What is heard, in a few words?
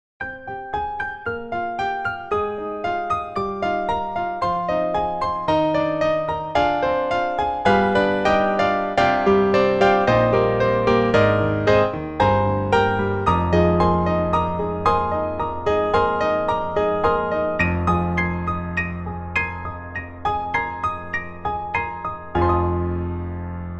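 Broken Piano; BlokenReality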